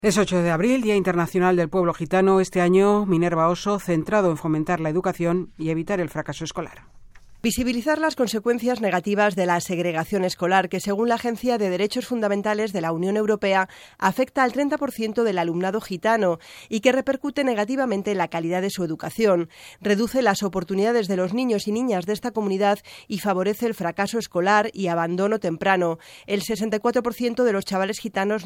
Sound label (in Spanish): pueblo gitano